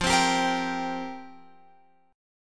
F Suspended 2nd Suspended 4th F down Guitar Open F; Fsus2sus4; strummed